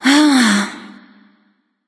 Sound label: ugh; smite